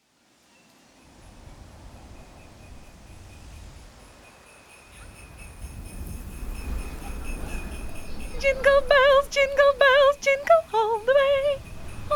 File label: SOPRANO Jingle Bells